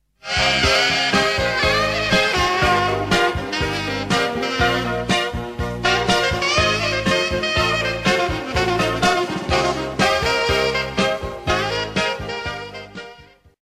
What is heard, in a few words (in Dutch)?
sax pop; Speelt de sax jazz rockroll pop